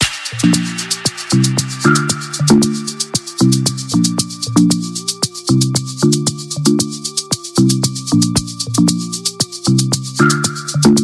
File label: Remix Instrumental